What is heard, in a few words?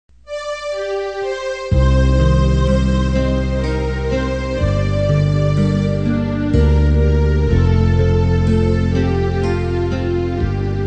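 soprano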